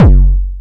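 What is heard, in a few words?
bass drum, drum machines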